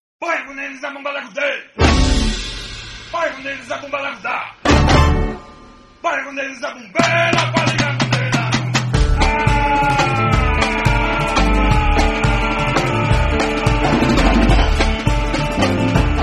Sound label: donkey beat